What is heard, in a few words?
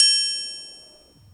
drum hit whistle